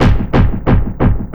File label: TRIP 90, trip loop